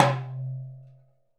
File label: Drum; Essential Percussion